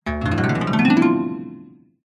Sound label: fantasy magic wand spell classic harp glissando low pitched fairy; zapsplat